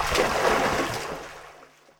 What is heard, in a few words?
wade; Ace of Spades